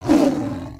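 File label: Sabertooth Disapprove; creatures; pets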